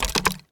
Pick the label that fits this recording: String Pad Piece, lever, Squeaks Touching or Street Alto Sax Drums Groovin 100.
lever